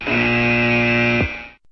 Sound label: buzzer